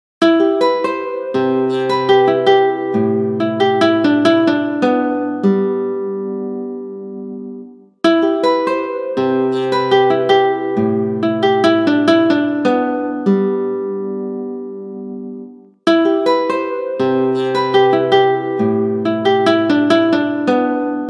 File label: guitar